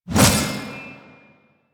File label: Shield Whoosh; Warframe; Duviri; Duviri Dax; Weapons; Duviri Mace And Shield